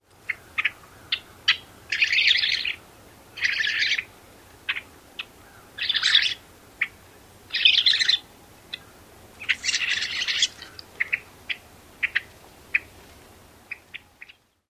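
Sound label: calls and gurgling songs, Rusty Blackbird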